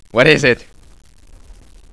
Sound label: disturb; svencoop